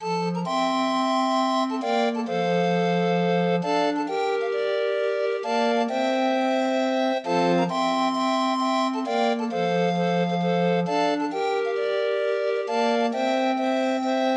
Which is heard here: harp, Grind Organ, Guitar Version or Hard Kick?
Grind Organ